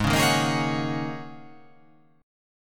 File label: G sharp 9th Suspended 4th G sharp